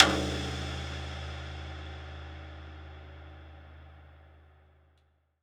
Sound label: Rim Shot Hit; Sonic Foundry (Sony Creative Software); The Electronic Music Manuscript Collection